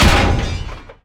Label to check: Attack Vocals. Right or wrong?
Wrong